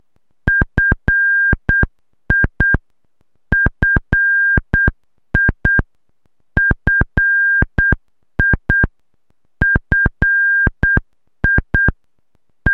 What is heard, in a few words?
Morse Code